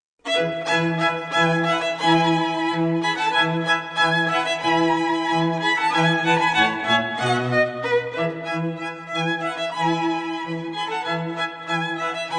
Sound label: festiva strings